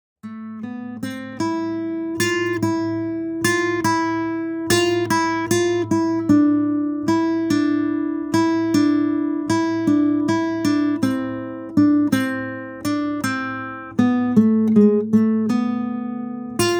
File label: Guitar